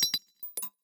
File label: coin